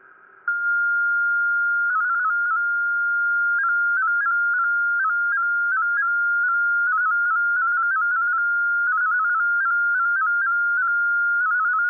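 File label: Coquelet 13 13 Bd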